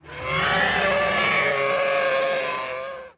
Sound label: death die